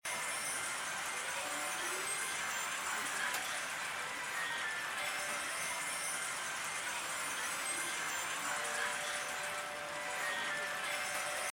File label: BGM; Lucky Spin; Lucky Wok